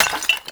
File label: Clay Pot Destroy; Gameplay; Awakening Remaster